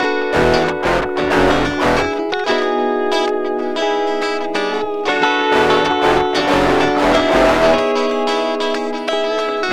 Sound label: ride